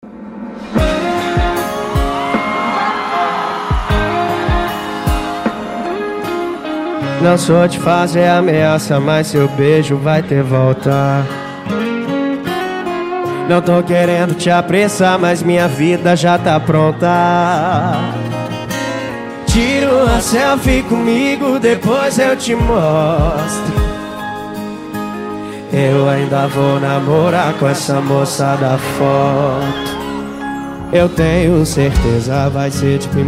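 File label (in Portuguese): especial sertanejo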